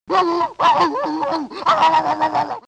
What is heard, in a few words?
Barks; Pluto